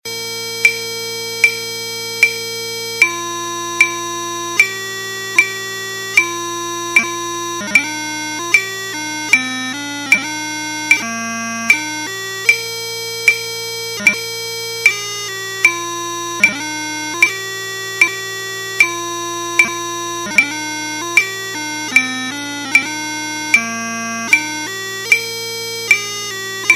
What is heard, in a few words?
Waltzing Matilda 76 bpm, piping; piping tutorial materials; highlanders music